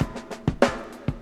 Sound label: Drum loops, Boombap